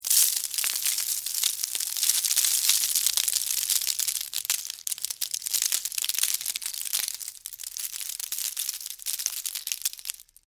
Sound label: Texture, Debris, Spill, Sugar, Cone, Waffle; BlastWave